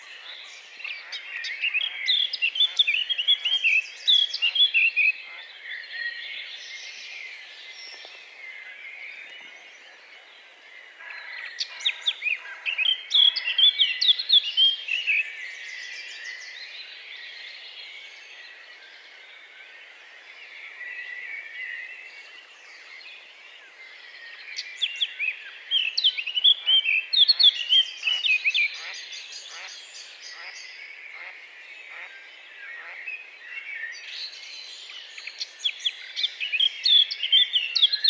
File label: Sylvia atricapilla; SETE